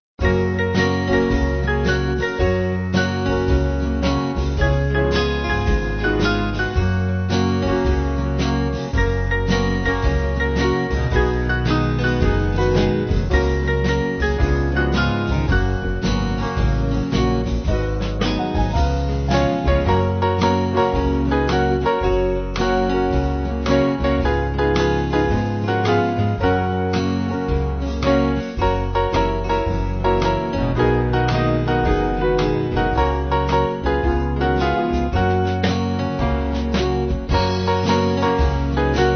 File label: Band